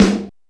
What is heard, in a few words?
SP1200, DRUMMACHINES